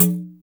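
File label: PANDERO OPEN; ETH. PERC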